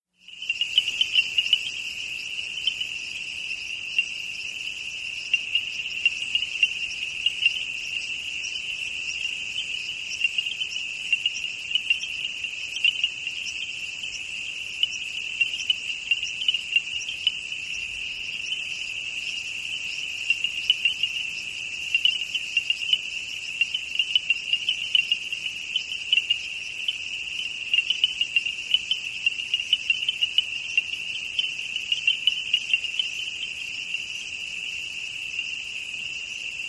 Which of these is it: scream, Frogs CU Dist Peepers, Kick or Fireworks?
Frogs CU Dist Peepers